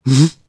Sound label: gulp